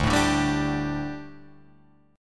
D Suspended 4th D, Guitar, Drop D

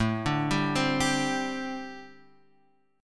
G Suspended 2nd Suspended 4th

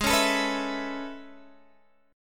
A Major 9th Ab, chord

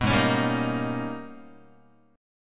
Ab Minor Major 11th, Guitar; strummed; JGuitar

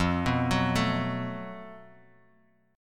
Gb Minor Major 7th Double Flat 5th F

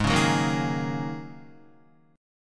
Ab 9th Suspended 4th Ab down Guitar; Ab9sus4 strummed; JGuitar

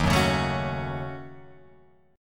D sharp Minor 7th Flat 5th, Guitar, Open F; D#m7b5 strummed; 3,1,1,4,4,1

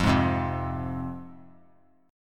F Minor F down; Fm strummed; JGuitar

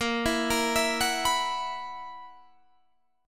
B Major 7th Suspended 4th Bb

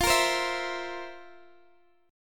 F Major 7th Suspended 4th, Guitar, Open D

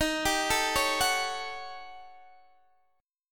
D sharp 7th Sharp 9th Flat 5th Guitar Open D

